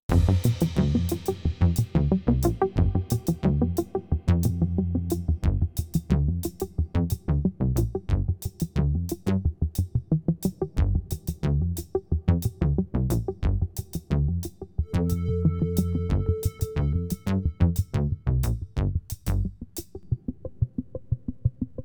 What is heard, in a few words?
Social Hub Ambient Low